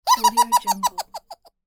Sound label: laugh